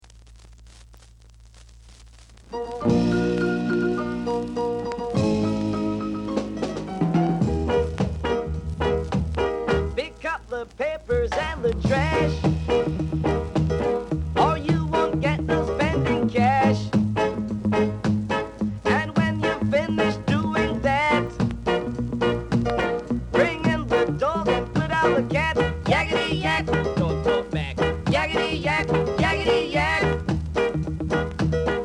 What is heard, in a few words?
drum